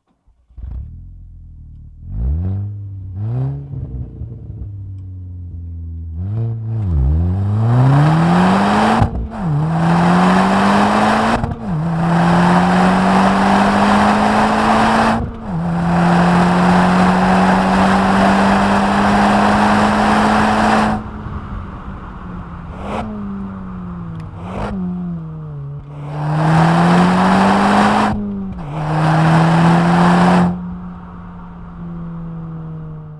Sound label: Volkswagen Golf R